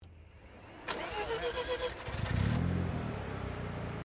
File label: CAR MAZDA RX7 SERIES3 EXTERIOR BONNET STARTUP; Mazda Rx7 1983; Cars (Sound Effects:Vehicles)